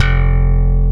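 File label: WHACK BASS F2; Drums In Your FACE!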